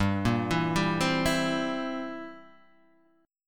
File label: C 7th G